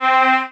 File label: violin